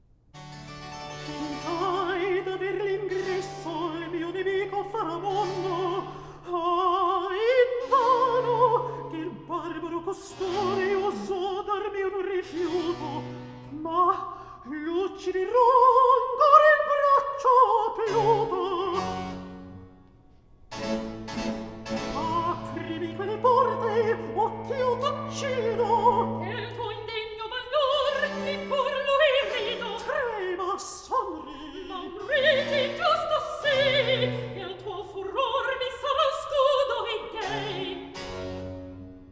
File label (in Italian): Recitativo; Tentai d'aver; Handel Masterworks; Klassika